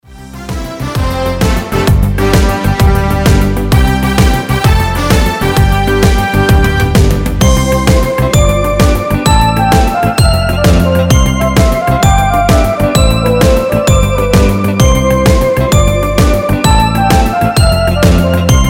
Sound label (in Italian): Hully Gully Ballo Di Gruppo; Canto